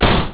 slam

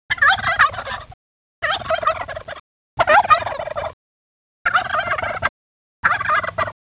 turkey

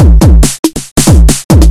140 Step

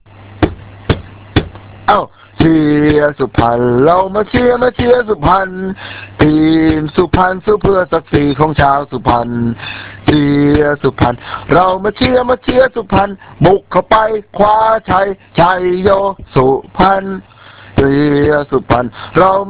CHEER; เพลงเชียร์สุพรรณ

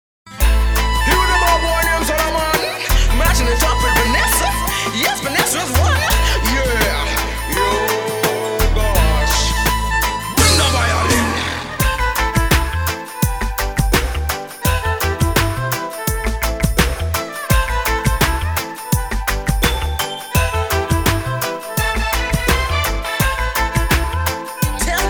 class gas reggae

